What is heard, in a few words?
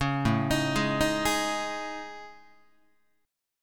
A Major 7th Flat 5th, down Guitar; AM7b5 arpeggiated; 4,5,6,6,7,7; JGuitar